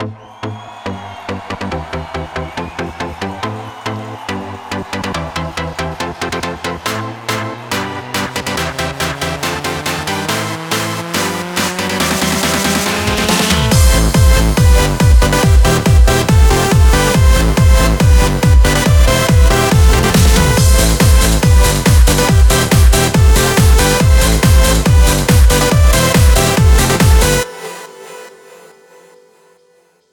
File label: 140 BPM Mix, Root A; Vengeance - Essential Clubsounds Vol.5; Demo Constr Kits; Nessi Kit